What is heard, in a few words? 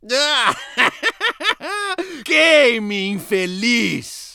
hahahahahahang